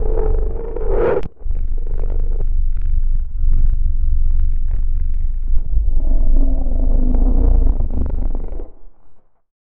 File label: low guttural roar process, glitching